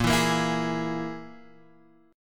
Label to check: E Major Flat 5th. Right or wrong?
Right